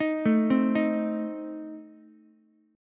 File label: A Diminished A 8,9,8,6 down Ukulele; Adim arpeggiated; JGuitar